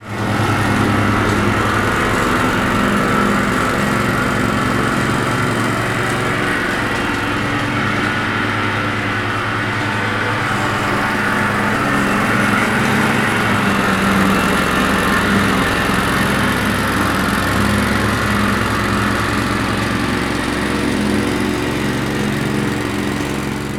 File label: ride on lawnmower; mechanical